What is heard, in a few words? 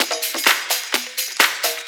Latin Loop 128 BPM